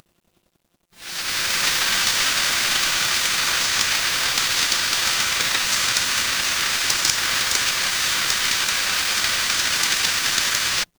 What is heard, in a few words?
ambience rain